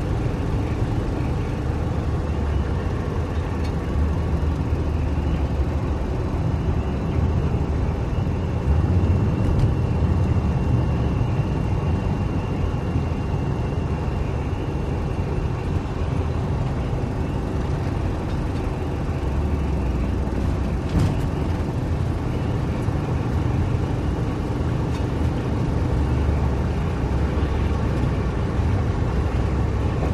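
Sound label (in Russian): авто volkswagen двигатель на низких оборотах запись внутри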